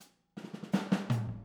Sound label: Boogaloo, Intro, Overhead, Drums, 82 BPM; Sonic Foundry; Drums from the Big Room The Mixes